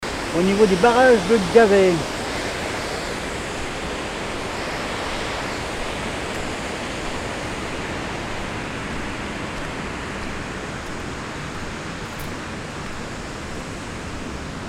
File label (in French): barrage Gavet; les ondes de l'eau